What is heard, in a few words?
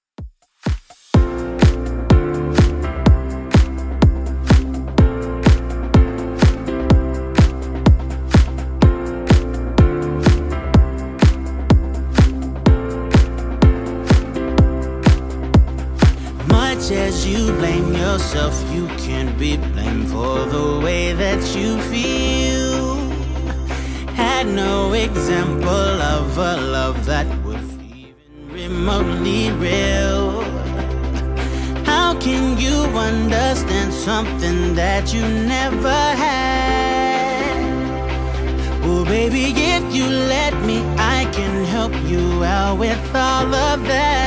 2ks DJ Friendly Redrum 125bpm